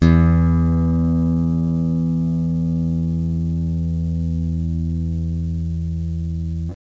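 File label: guitar single noisy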